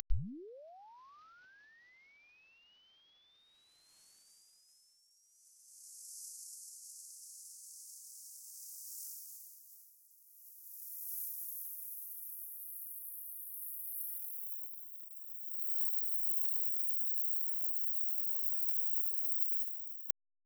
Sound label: difference sweep